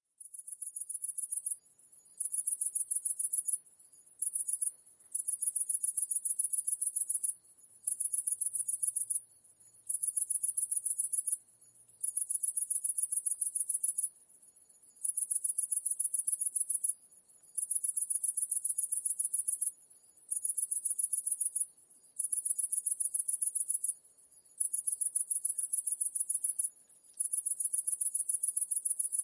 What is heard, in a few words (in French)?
Grande sauterelle verte Tettigonia viridissima